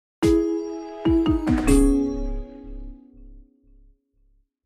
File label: drum ambiance; Logos